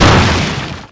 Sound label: WOOD EXP, HARPOON; Messiah; WEAPON